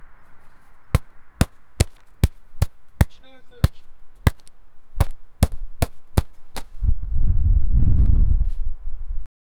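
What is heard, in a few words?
football slipping from hands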